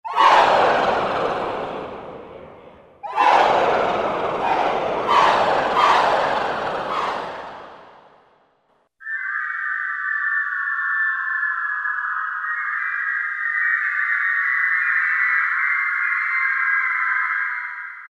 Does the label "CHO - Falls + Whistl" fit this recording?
Yes